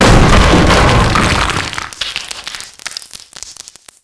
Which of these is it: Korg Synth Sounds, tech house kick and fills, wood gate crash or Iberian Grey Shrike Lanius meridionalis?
wood gate crash